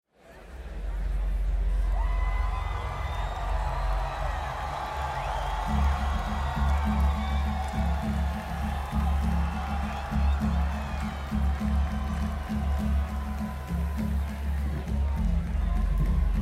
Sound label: Tribal Drum Intro